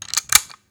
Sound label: Inf Weapons Foley; Red Orchestra Ostfront 41-45